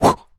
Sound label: Whoosh Dash